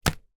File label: foley cheque book put down on table